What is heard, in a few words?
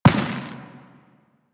Step Dirt, Footsteps; Warframe; Teshin